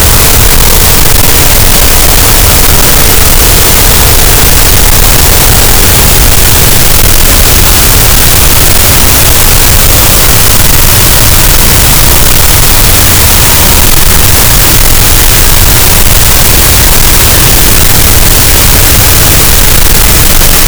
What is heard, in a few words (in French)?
cachalot, Physeter Macrocephalus, gaussian noise